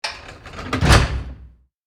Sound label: Ship door hatch close